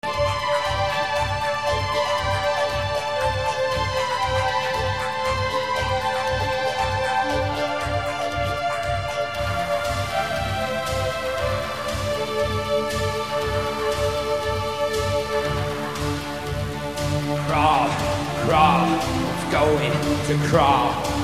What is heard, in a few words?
crawler live